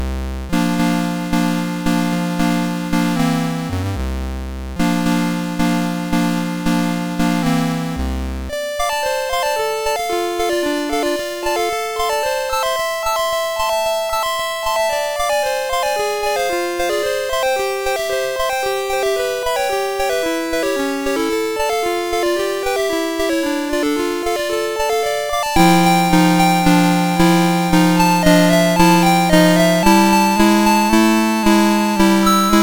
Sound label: Stardust, MOS6581R2; David W Tattam